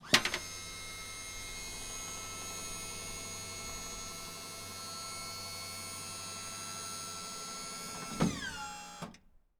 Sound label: Electric Lift; 99Sounds; The Warehouse